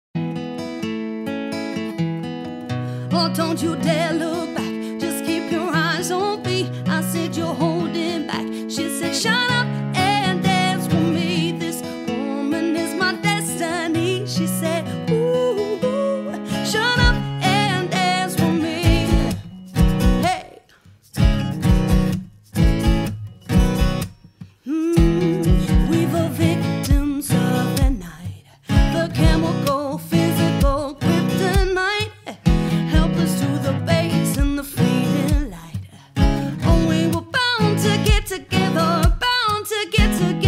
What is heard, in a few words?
Acoustic Duo Cover